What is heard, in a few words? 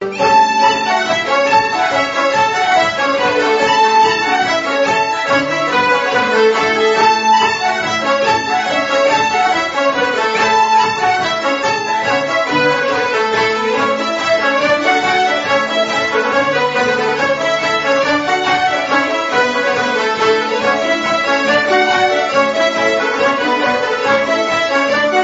Polka; Bill Sullivan-s Polka